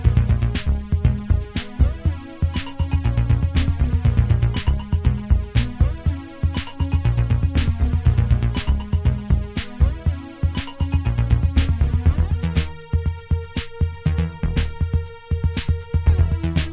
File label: keys